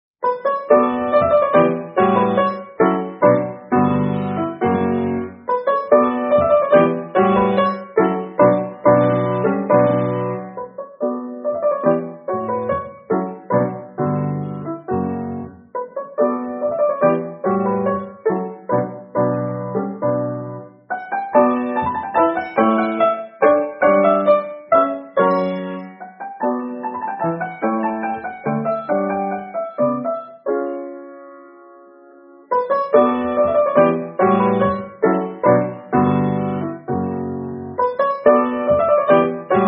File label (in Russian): фортепиано; Д. Томази; Менуэт